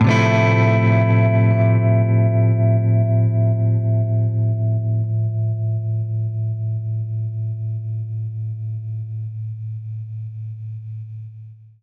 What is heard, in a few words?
Baritone Chord Aminb6, Guitar Chords